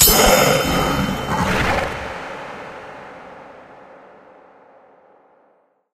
medal click rare; ui; yeti

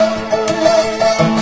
dobro danger; music; Left 4 Dead 2; Source Engine; zombat

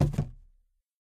phys cube, physics

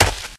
grass, footsteps, infected, walk; player; source engine; left 4 dead 2

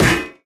physics turret impact md

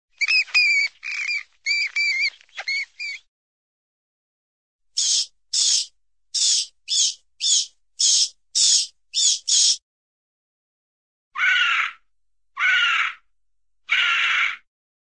bat squeals, animal; cartoon

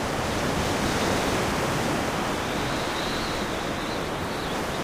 broken window wind; video games; ambient; Source Engine; tf; lair

